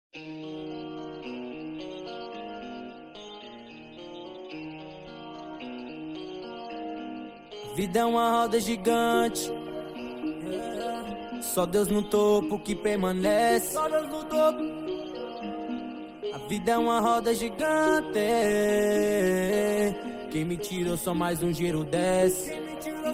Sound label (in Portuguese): graves bass